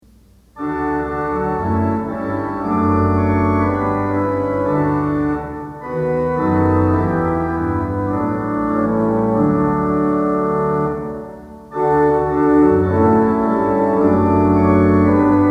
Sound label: O Lord, Thou Only Knowest the Hour, Organ Music for Worship Services; Twenty Liturgy Hymns